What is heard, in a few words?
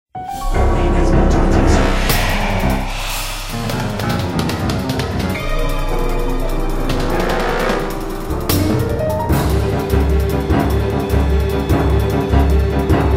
Fight Start; music; Silas; The Da Vinci Code; XBOX